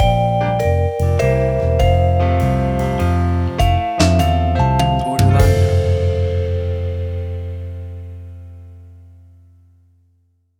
Away In A Manger Christmas Jazz Stinger

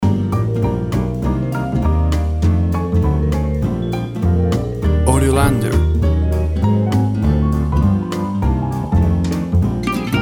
Warm Paris Gypsy Jazz Night

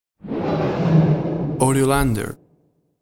Witch Cry Low, A scary and haunting horror; A scary and haunting horror atmosphere with element of thriller, 80s horror films

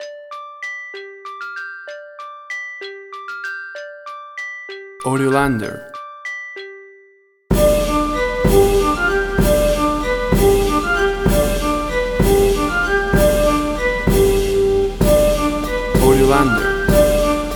California Calliope

